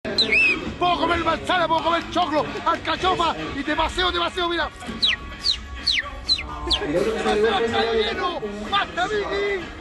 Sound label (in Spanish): silbido